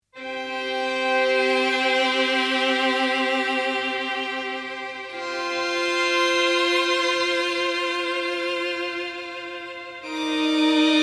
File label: violin expr